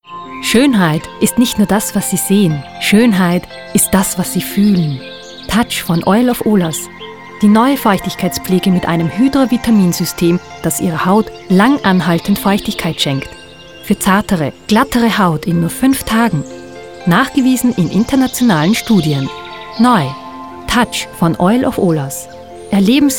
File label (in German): Werbung Musik; Stimme